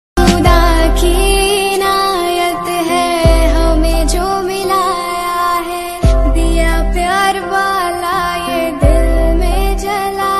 Female Ringtone

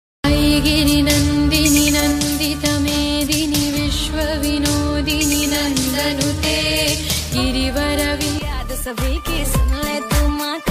Navratri Mix Song Ringtone

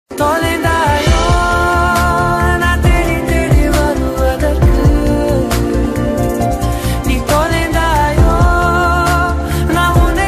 Ringtone Tamil; Share Chat

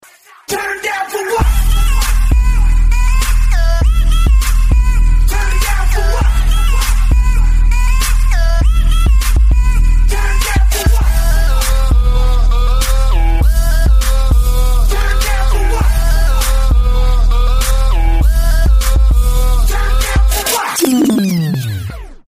Ringtone For Android Mobile

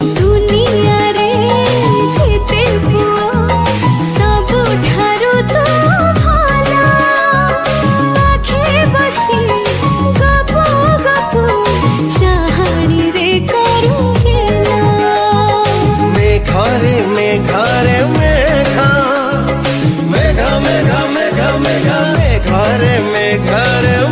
Odia Song Ringtone

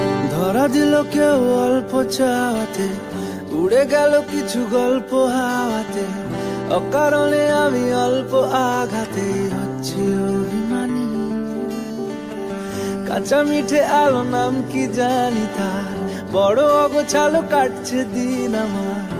Song Ringtone

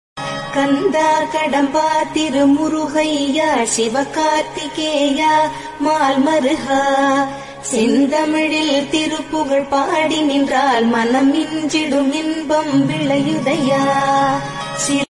Murugan Devotional Tamil Ringtone; ringtones

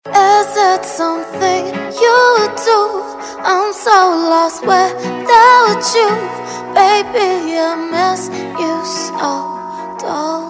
Bollywood Song Ringtone

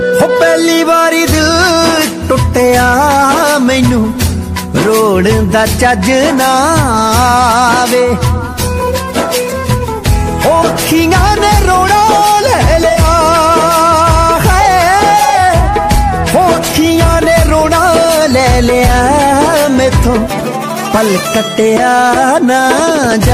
Sad Punjabi Song Ringtone

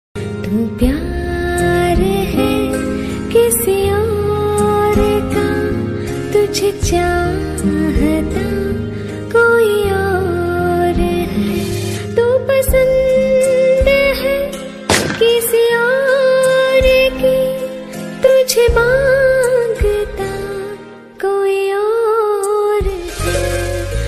Female Ringtone

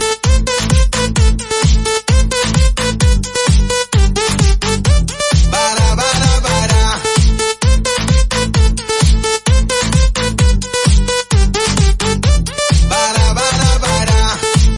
Remix BGM Ringtone

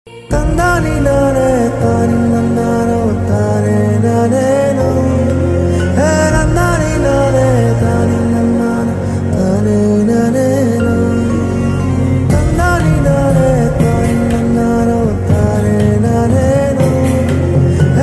Ringtone Tamil; ringtones; KGF

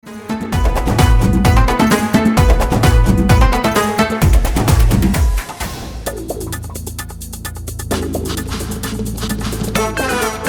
Music Ringtone

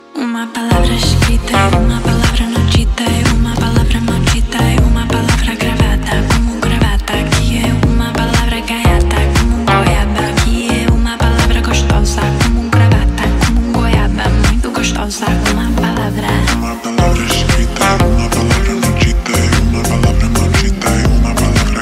Remix Ringtone

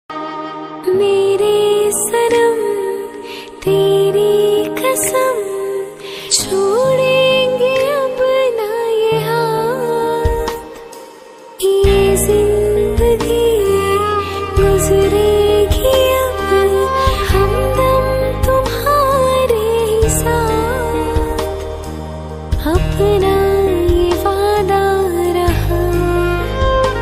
Female Love Song Ringtone

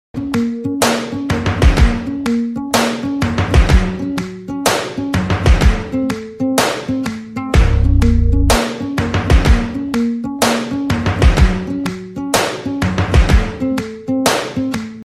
Ringtone Instrumental

